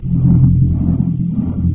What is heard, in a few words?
fan; moria